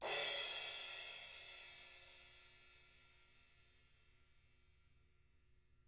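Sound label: CRASH 16, CYMBAL; CYMBAL KITS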